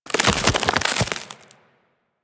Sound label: Ice Wave Spawn; Enemies; Abilities; Warframe; Corpus; Arachnoid Camper Terra